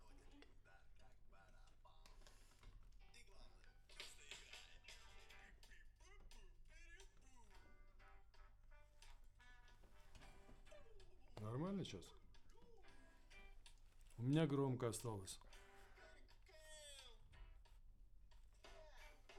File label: Alt, piano